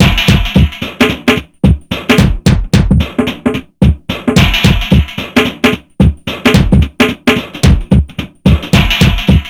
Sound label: HIPHOP; INIS; Funky Xtreams